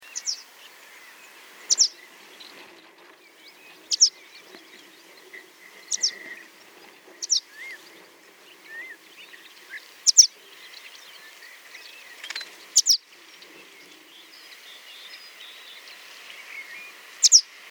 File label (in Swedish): klippsvala